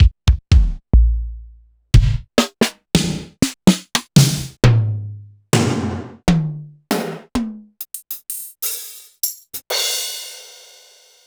Korg 01W TotalKit

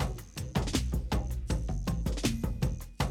Classic DrumLoops; Best Service; XXL 1500 Classic DrumLoops

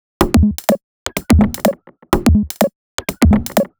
125bpm, House Drumloops

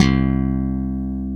CHOP C2, Bass; AKAI S6000 CD-ROM - Ultimate

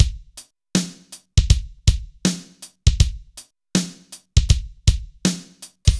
BPM 80 AMB; AKAI S-Series CD-ROM Sound Library VOL-4

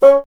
WND BSN, Bassoon; Miroslav Vitous - Solo Instruments VOL-1

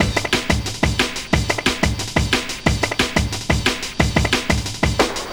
Pool Hall Beat, Drum & Bass; Fluid Dynamics

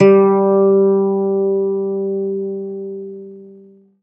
ZITHER, Alpine Volksmusik; Best Service

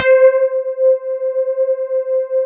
SYNBASS; Synthesizers; Soli-Music; World of Synthesizers VOL-1

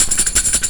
Shake 170, Drum&Bass Perc Loops; EZRollers

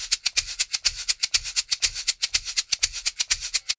SHAK, Roots Disco; USB Soundscan vol.32; AKAI

